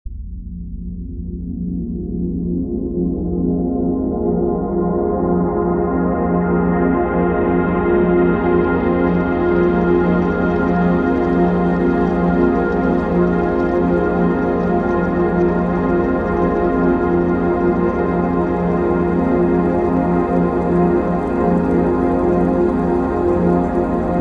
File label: Atmos and Pads